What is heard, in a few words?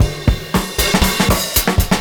CYMB, Breakbeats; USB Soundscan vol.46; AKAI